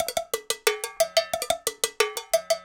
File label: Cow Bell, Latin Percussion